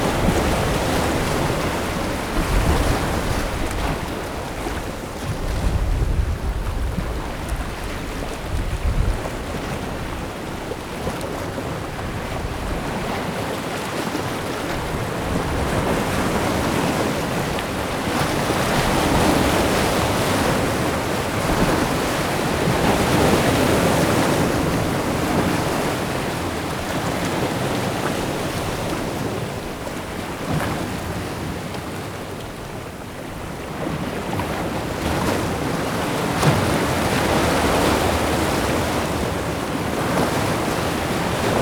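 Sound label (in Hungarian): keleti molo, tiz meterre a koveken megtornek a hullamok, a vizzel a mikrofonokig ellapul, tenger; thassos 2014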